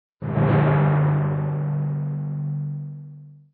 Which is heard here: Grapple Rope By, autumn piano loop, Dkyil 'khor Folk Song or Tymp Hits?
Tymp Hits